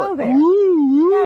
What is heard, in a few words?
uwuwuwuwu